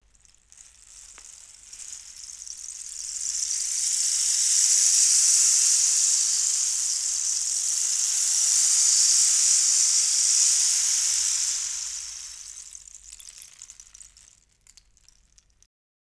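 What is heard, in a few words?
rain stick; Univers Sons - Raricussions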